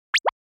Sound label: Motion Pop